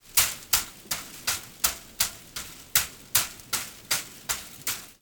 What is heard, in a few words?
Mvmt of Metal Baskets